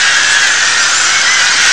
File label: crowd; Amiga Soundtracker Sample Packs